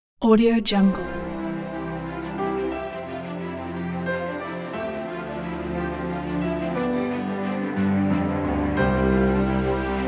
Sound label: cinematic adventure